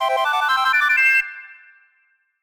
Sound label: Collect Giant Gold Feather; Banjo-Kazooie